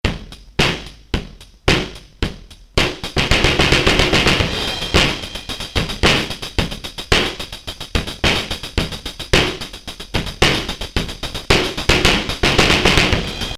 Carbon Mic Test solo

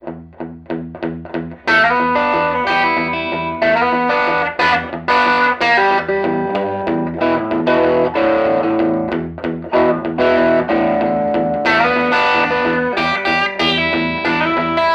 Superior Amp Bridge Pickup

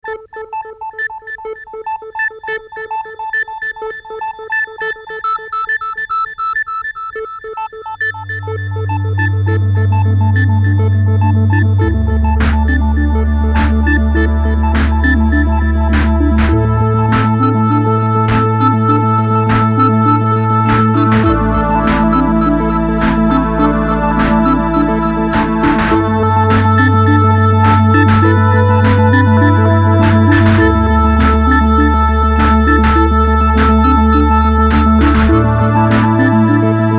Yamaha MT44D

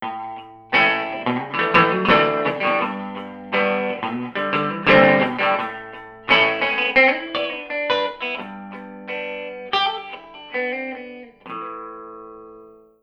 High only

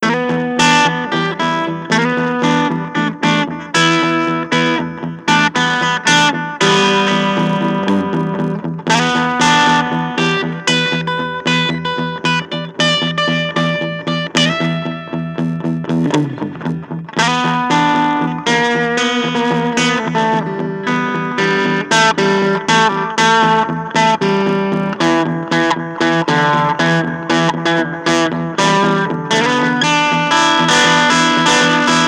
Magnasync URS overdriven

